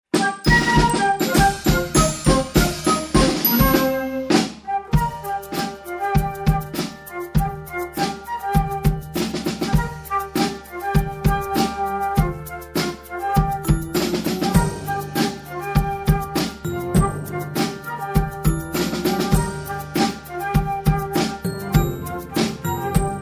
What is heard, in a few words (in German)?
klangbeispiel floetenorchester